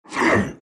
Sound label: shards pain; terrorblade